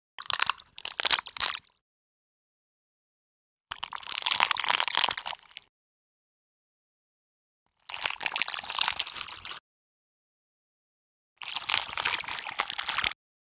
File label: Crunching sound of breaking bones.; Crunches; Bone Crushes; Objects